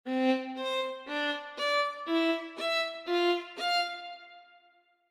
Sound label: portamento Test Eighths